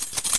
KEY

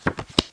turn

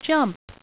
JUMP

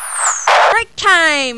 BRK BOMB

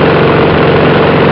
JET

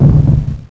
missile

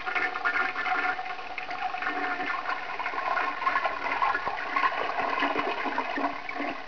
Flush

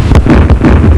BOOM

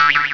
CAN

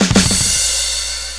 drums

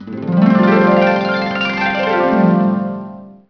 HARP

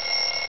WAKE UP

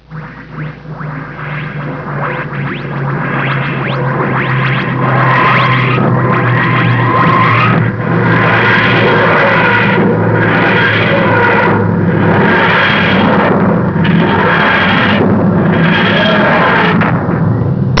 TARDIS; Dalek

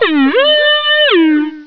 ALARM

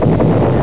fgt hit

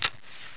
CLICK

